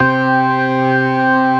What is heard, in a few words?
48 STOPS, Synth and Organ; InVision Interactive; Keith Emerson; The Most Dangerous Synth and Organ; ALL STOPS